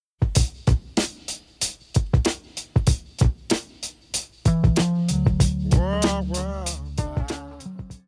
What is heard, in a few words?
Beat